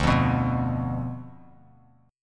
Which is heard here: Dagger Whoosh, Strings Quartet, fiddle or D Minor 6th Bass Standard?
D Minor 6th Bass Standard